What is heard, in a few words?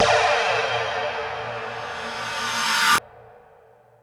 FX Sounds, Drum Machines